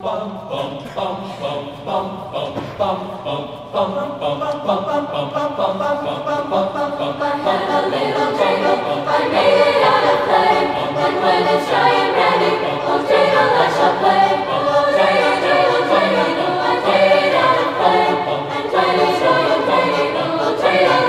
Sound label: Dreidel SATB